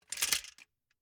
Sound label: Gumball machine, metal, glass, candy, switch, mechanism, rotate, dropping, hyper; Eiravaein Works; Vaeyan IV